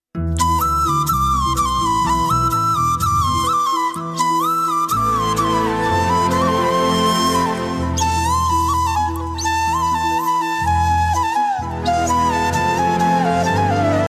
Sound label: flute ringtone; Kantara